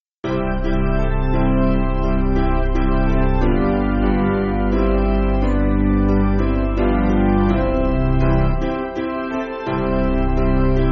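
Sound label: Piano, Free Music Backing Files for Churches; Towner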